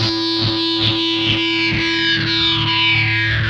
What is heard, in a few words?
SEARCHLIGHT, Funk Guitar; Zero G - Funk Guitar